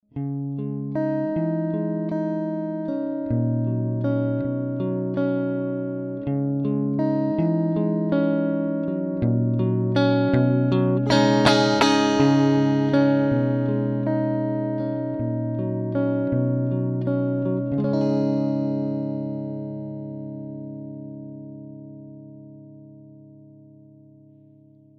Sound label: AC30 mod crunch